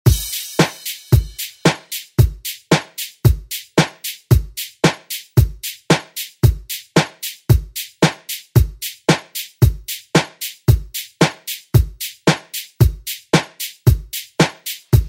90s Re Drum; DJ Mhark

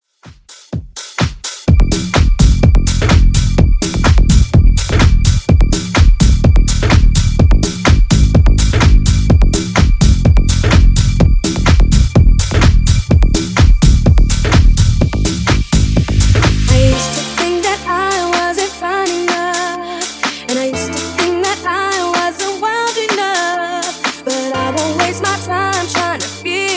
Funk Bass 126 Bpm

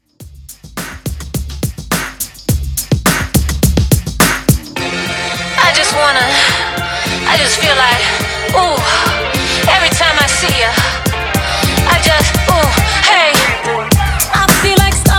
Pop, 105 BPM

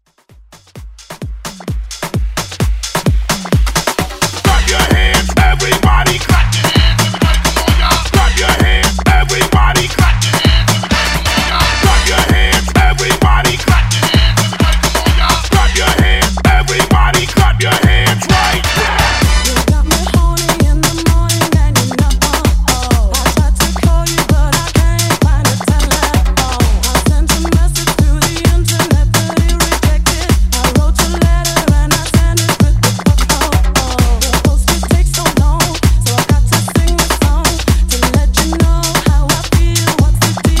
Afro Hype; Afro Hype Edit

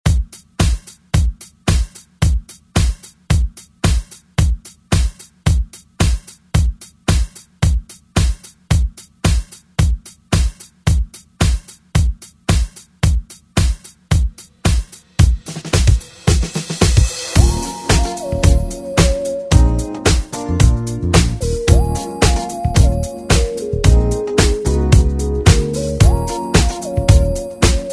90s ReDrum; DJ Mhark

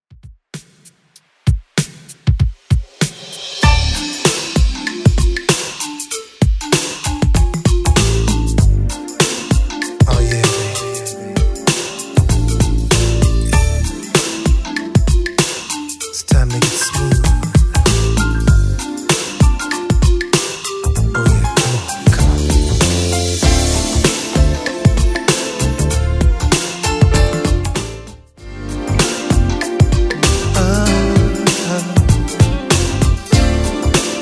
90s Redrum, 97 bpm

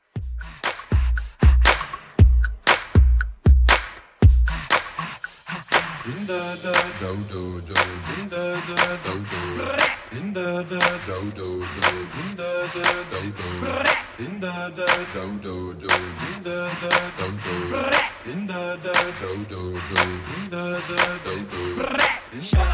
Redrum 118bpm

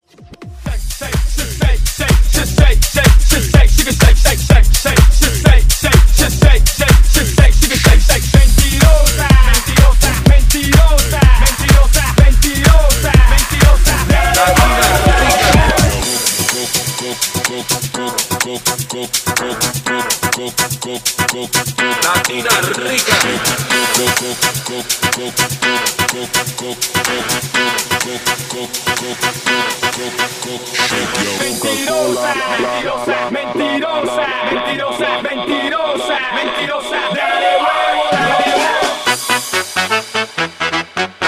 Latin House Redrum

